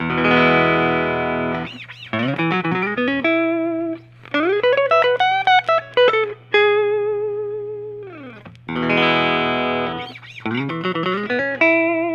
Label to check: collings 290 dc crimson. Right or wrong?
Right